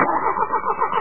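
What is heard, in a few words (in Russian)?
не могут завести двигатель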